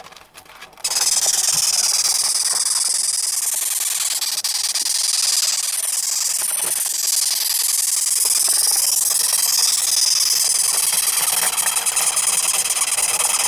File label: velvet red ant, the recording is of the insect crawling around; soundscapes